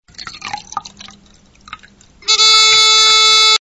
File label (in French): Detecteur Sonore De Niveau De Liquide